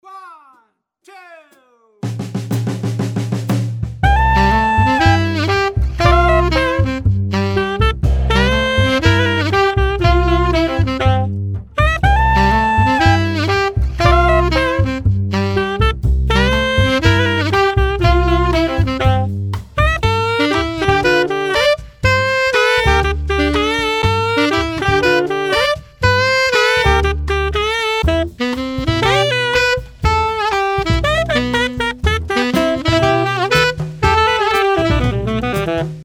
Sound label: swing